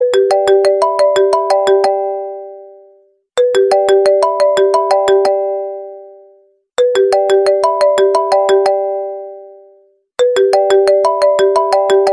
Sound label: IPhone classico; toque de celular